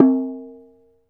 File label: CLAY, Cameroon; AKAI S6000 CD-ROM - WorldSounds; CAMEROON PRC